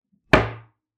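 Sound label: door slam; hlopok dveri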